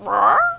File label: chicken